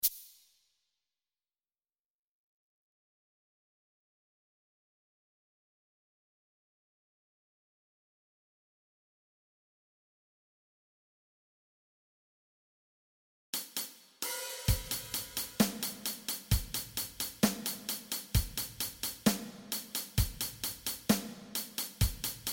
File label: Drums